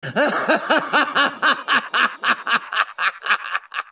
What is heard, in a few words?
laughing; shigaraki